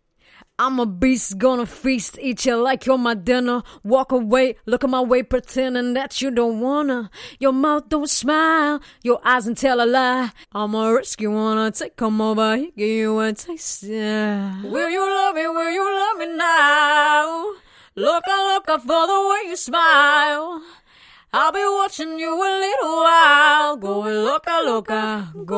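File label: Trap rap sing, 144, D minor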